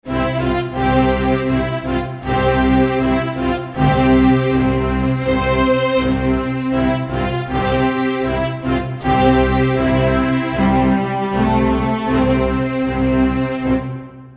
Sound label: Happy Start Orchester Jingle; Jingles for films podcasts